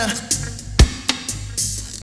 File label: DRUMLOOP, Ragga & Dub Reggae